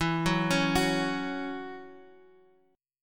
Db Minor Double Flat 5th E

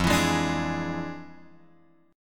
F Minor Major 13th, F; {1 X 2 1 3 4} strummed

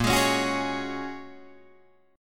Bb Minor 7th Sharp 5th, Guitar, DADGAD

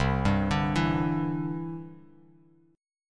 C-Major Add 9th, Bass Standard; arpeggiated

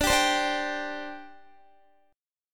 D 7th Suspended 4th, down, Guitar

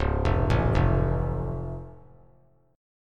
Db Augmented, down; arpeggiated; JGuitar

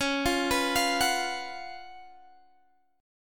C sharp 11th C sharp, Guitar, Open G

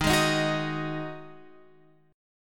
D sharp Minor Double Flat 5th

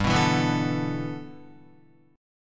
G 6th Add 9th G Guitar, chord; G6add9 strummed

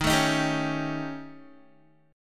Eb 7th Flat 5th Eb down Guitar; Eb7b5 strummed; JGuitar

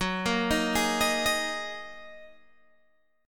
F sharp 6th Add 9th F sharp Guitar Open G

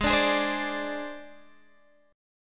Ab Major Flat 5th Ab, Guitar Standard; strummed; x,11,12,13,13,10

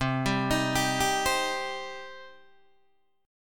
C Minor C down Guitar DADGAD

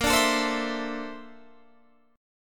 Bb Major Sharp 11th Bb, chord

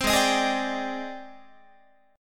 G sharp Major Sharp 11th, Guitar; G#M#11; 15,16,18,18,18,18; strummed

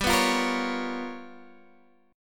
G 7th Sharp 9th Flat 5th G, Guitar Half Step Down

